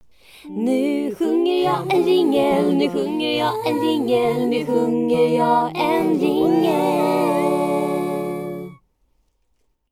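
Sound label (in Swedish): Vokal Jingel i 7 stämmor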